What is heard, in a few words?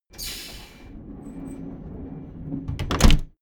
train intercity train door close